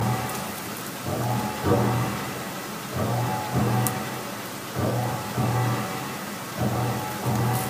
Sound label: nest pulse